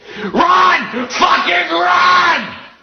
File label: YELLING